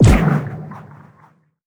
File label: scavenger bot missile; Stellaris; distant stars